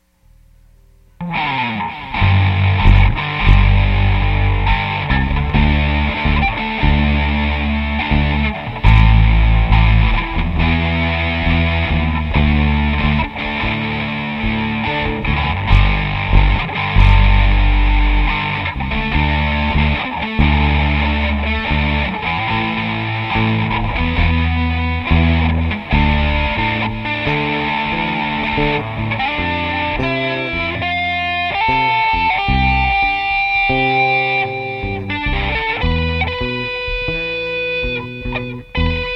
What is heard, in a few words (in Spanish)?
solo de guitarra